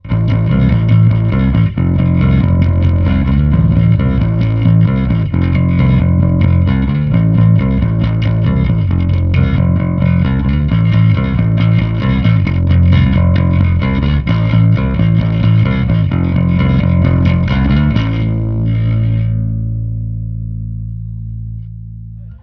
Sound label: hiwatt, bass